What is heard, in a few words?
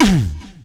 VOC DRUM, MOUTH PERC; Best Service Hyper Dance